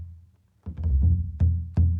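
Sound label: Calm Far, music; from dust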